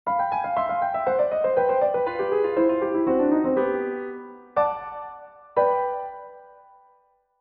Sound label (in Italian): Piccolo finale in sol maggiore; quaderno Wierlhorsky